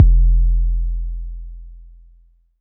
Bass Drop, 808; Street Anthems Sound Kit

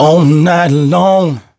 Vocal Phrases; Vengeance Samples; Vocals

